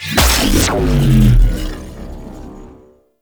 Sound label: Thermal Shock Hit; Warframe; Runner